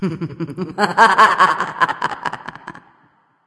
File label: laugh; smite